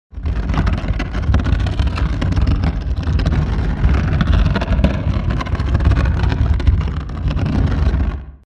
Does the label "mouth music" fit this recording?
No